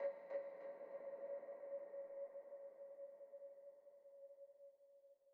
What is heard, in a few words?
Env Field Night Cave; the legend of zelda tears of the kingdom